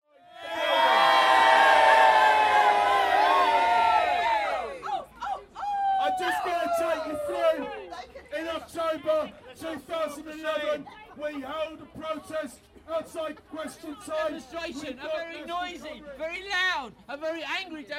soundscape from last nights demonstration outside Goldsmiths University